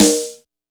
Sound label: kit, Yamaha Motif-ES8